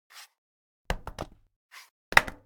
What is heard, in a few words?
take off a slipper and drop; human; NS Studios - ultra sound collection